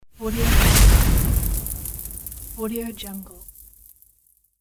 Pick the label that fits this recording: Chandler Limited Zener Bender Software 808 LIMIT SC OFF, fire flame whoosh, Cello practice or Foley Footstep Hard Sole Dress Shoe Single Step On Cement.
fire flame whoosh